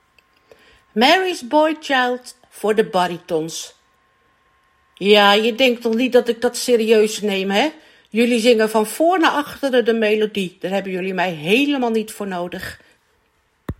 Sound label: Bassen